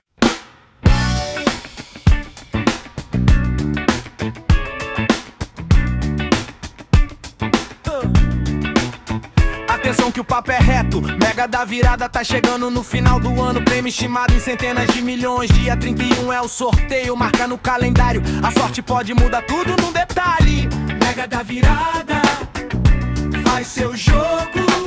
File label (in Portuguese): Funk; Mega da Virada